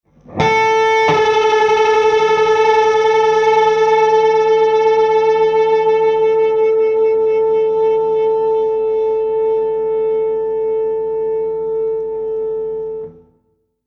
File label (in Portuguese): Batimento